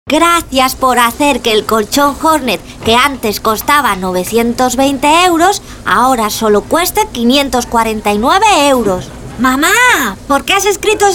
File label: beds